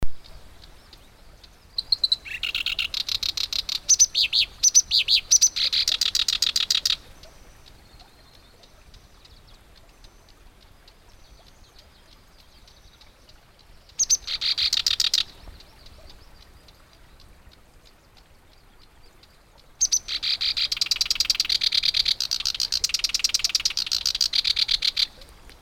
warbler black browed reed Acrocephalus bistrigiceps